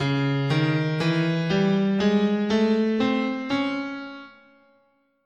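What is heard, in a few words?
D flat Hungarian Gypsy, guitar, scale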